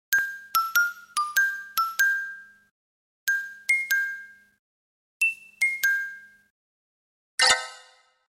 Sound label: tui diamond lake